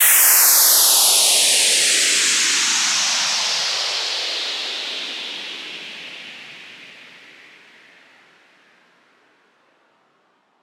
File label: Downshifter White; Riddim; One-Shots; SFX; Ghosthack; Riddim Essentials Volume 2